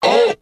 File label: "oh", crowd